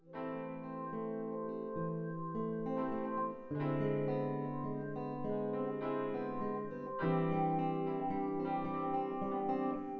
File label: no drums synth conv 5cm mix; SASSEC07